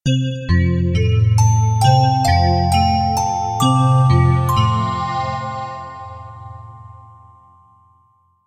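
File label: sounds with effect of completion, ending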